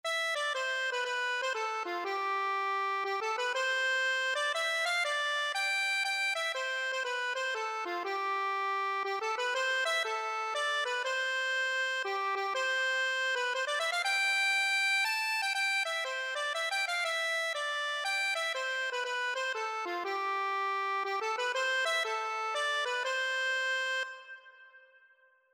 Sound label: accordion